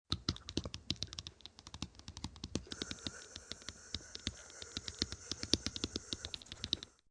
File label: Chattering Fast, Teeth Chattering, fast, cold, shivering, dentures (human voice); Talking Sound Effects